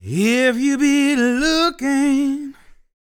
DELTA, BLUES

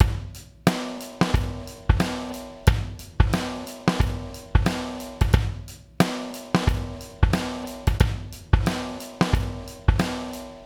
Beat, Hip-Hop

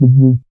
SYN MC WAH, Roland MC-202; Sound & Vision - Gigapack I CD 2

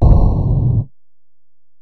EPerc, Alesis DM-PRO; 300 Drum Machines

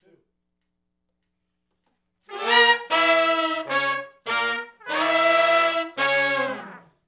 R&B Riff (C#) unison, Horncraft; Sony Creative Software; Crimson Blue and Fabulous Horncraft 4 RnB; Horncraft for R&B; Sections

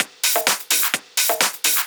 Discohouse Loop 128 BPM

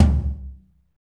tom, Fast Tekno, Roland; Northstar - Drumscapes Roland

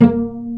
PIZZ CELLI; E-MU Formula 4000 Series Vol. 5; Protozoa; Proteus2 Instrmt

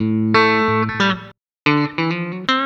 COUNTRY

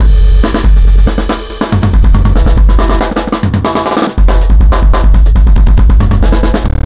Chopped Up, Breakbeats, Drum n Bass; KJ Sawka; Drum n Bass & Beyond

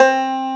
banjo C4; AKAI S-Series CD-ROM Sound Library VOL-2

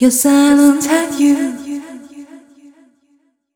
f mel g, fem Vocals

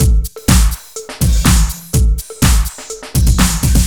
LOOP 124

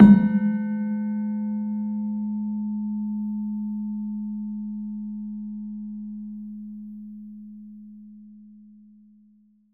PELOG G#1, PELOG BASS; Soundscan vol.60; AKAI

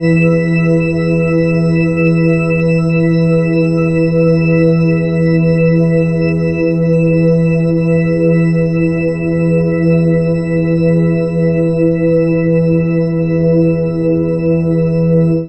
pad

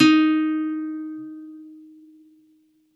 Norm D#4, Campfire Nylon Guitar

